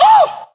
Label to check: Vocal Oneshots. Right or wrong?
Right